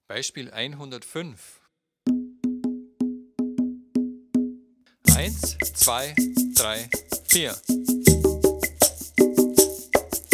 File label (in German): viererrhythmus